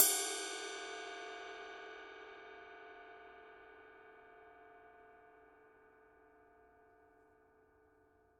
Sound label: paiste signature dark energy 22 dark energy ride mark ii body